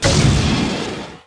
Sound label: Player Killed; PC Gamer IT CD 32; SWARM